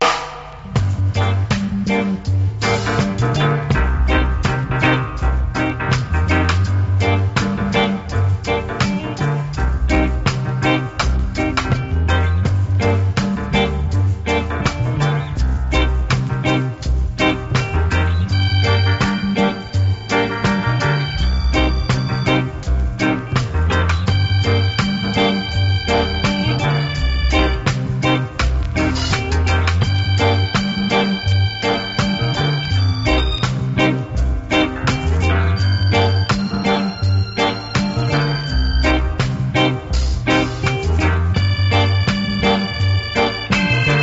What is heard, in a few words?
Eastern Organ; Common Ground International